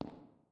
Footstep Glass; Among Us

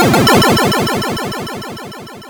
Boss Explosion; Shinobi II The Silent Fury; Game Gear

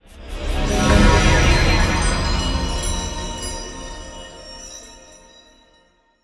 Stinger Achievement Full; starcraft 2 wings of liberty

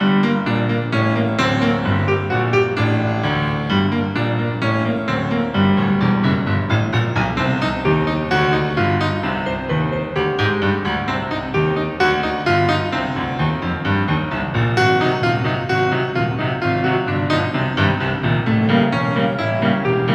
Library Piano; luigi's mansion dark moon

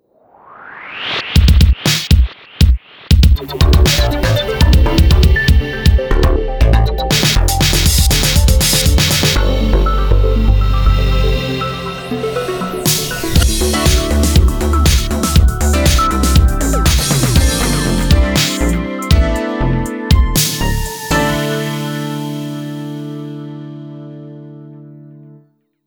ending; milestone sound collection; CONVERGENCE

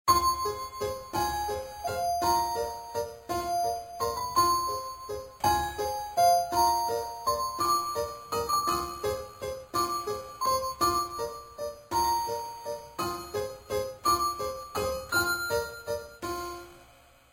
Ice cream truck; crash drive 2 3 windows gamerip 2015

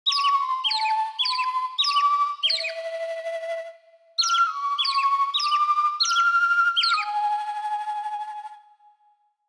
Chirping; Legend of Zelda The Breath of the Wild Original Soundtrack Limited Edition; Notts's Chirping

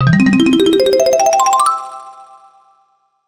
All Notes Collected; Banjo-Kazooie HD; N64 gamerip 1998

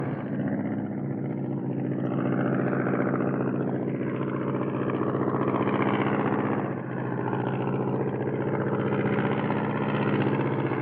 Distant Plane Loop; The 39 Steps

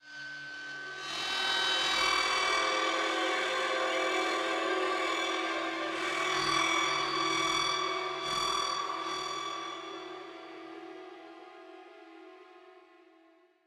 Stinger Intensity; The Park

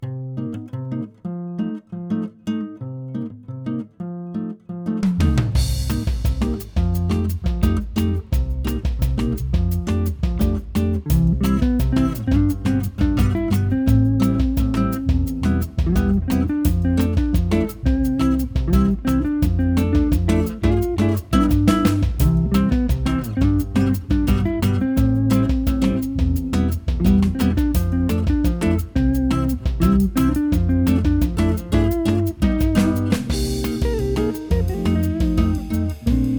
Bossa

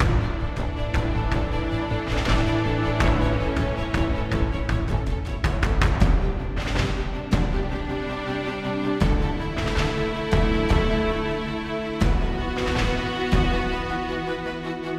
Mood Mid

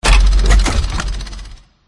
City Complete

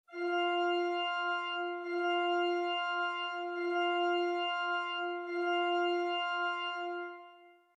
song bridal chorus intro; Octodad Dadliest Catch

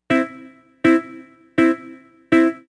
Guitar

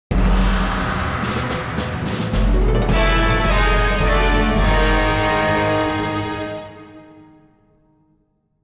Gravestone (Game Over); Metal Slug

